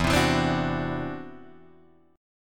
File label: E 7th Suspended 2nd Sharp 5th, E, down, Guitar, Drop D